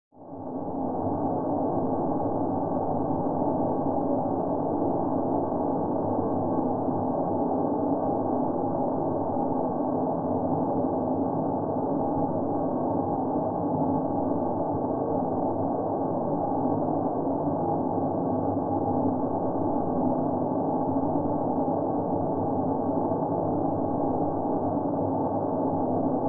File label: Ambience Planet Surface Drone. Air Pressure Noise Drone